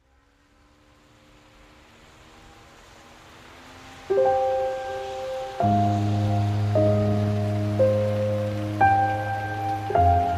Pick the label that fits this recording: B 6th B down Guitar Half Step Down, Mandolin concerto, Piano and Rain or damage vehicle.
Piano and Rain